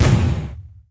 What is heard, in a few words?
TANK ROKS; lander